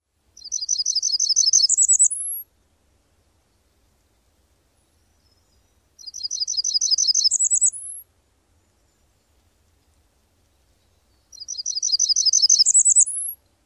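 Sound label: songs, Blackburnian Warbler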